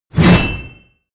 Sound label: blade whoosh large